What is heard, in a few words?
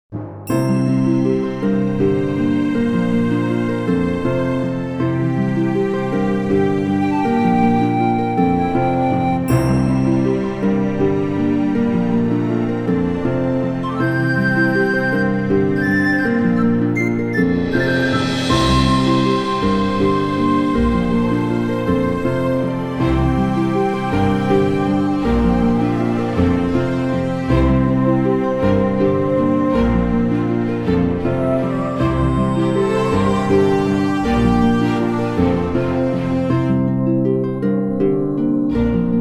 Orchestra; orchestration